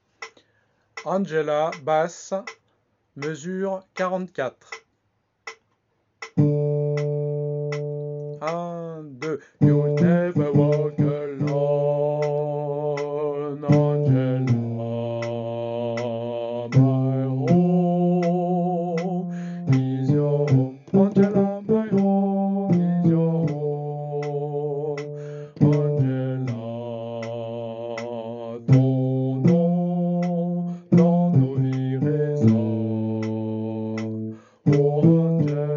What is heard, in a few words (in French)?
basse